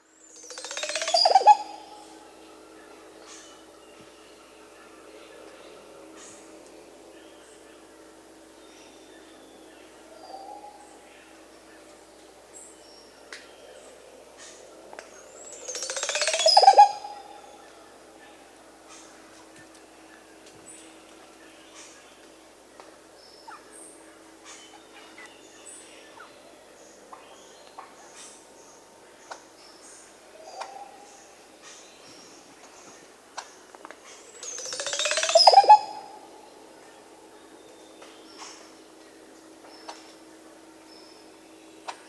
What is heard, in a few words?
Olive Oropendola (Psarocolius yuracares), Peru; S. America